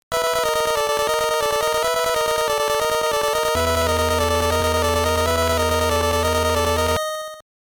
Jingle; Ferret Monogatari Dear My Friend; gbc

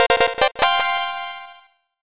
Jingle Level Up!

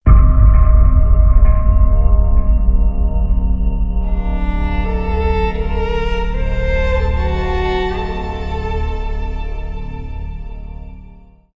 suspense; gulag intro

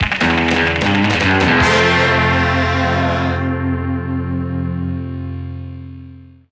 Goal Fanfare; crash bandicoot series sound box; Deep Sea Driving; 2nd Place

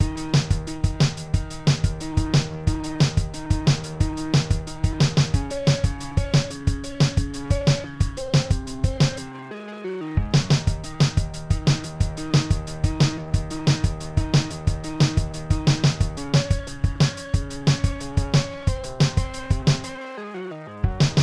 Boss Music; High On Life; Krubis Boss Music B

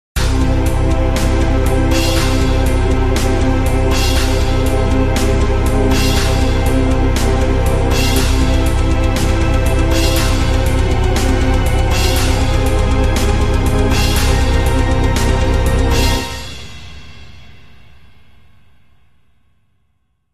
Mission 1 Completed

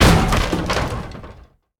Hit Kick Door Open; Lucius III

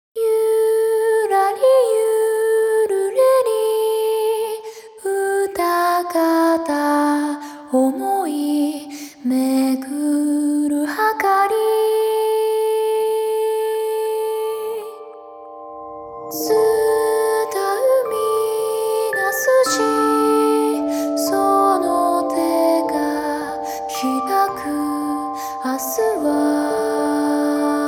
Song Japanese; fire emblem fates if

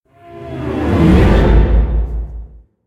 Stinger; Rollecoaster Mouth; The Park

